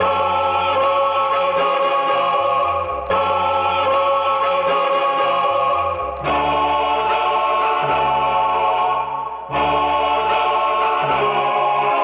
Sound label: voice chorale of demons, Loops, Sad